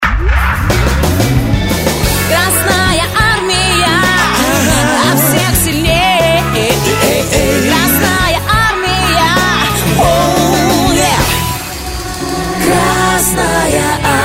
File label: rock